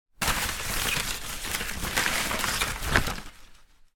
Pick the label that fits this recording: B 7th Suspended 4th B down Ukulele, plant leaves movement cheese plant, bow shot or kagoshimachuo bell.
plant leaves movement cheese plant